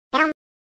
mlem sound effect; yoshis

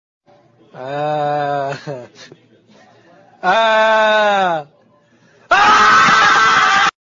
aaaa aaaaa aaaaaa

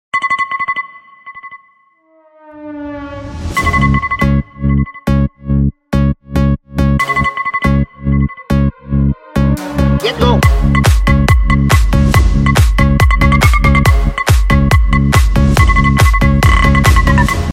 radar iPhone remix; เสียงนาฬิกาปลุก; nhac chuong bao thuc

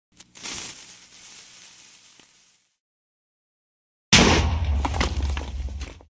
tnt explosion; minecraft

